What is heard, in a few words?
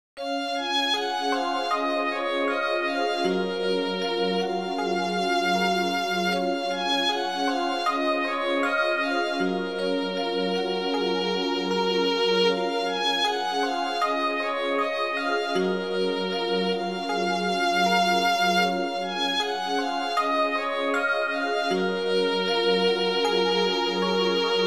Violin Solo